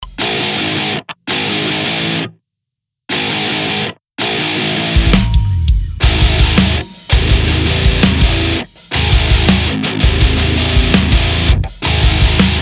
kisses of humanity short loop no synth

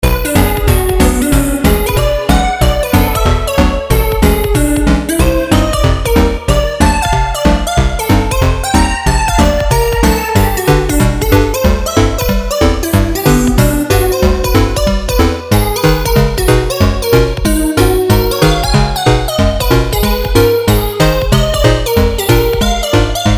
Happy But Frozen In Time (Chiptune)

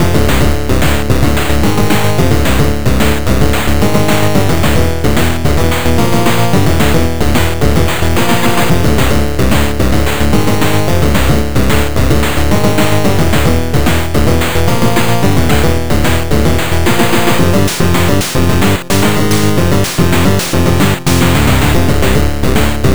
Boss main (8-bit)

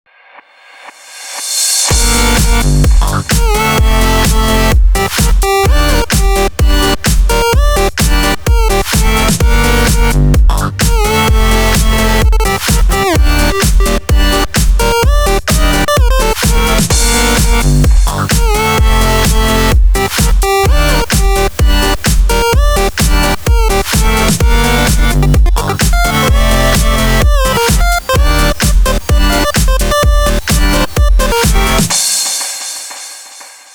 Electro Opening Theme

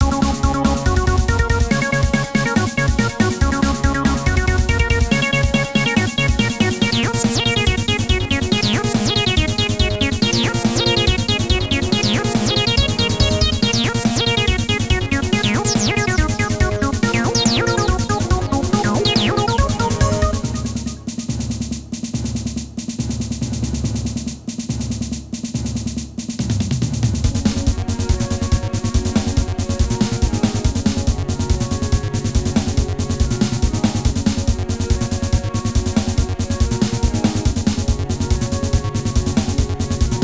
music victory theme

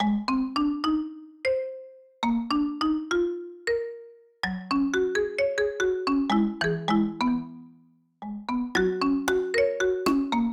more bells and bells

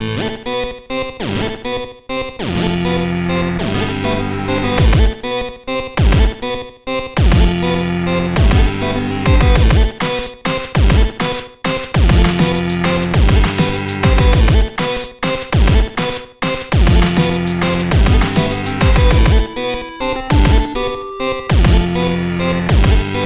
ELECTRO

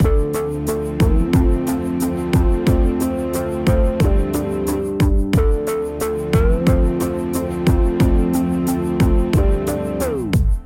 soft beat

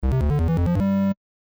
8Bit Action Power Up; Bogart VGM; 8Bit Action Soundtrack

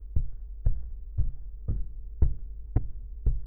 steps in wood floor

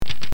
gun reload lock or click sound

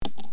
small item fall

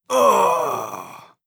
death groan male

